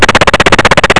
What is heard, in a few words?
machine gun